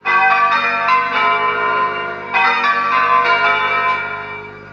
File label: church; schattenlander